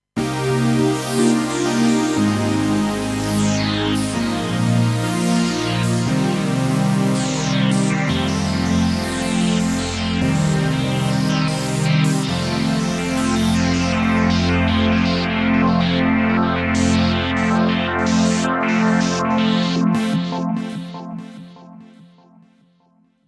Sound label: Random Fat Pad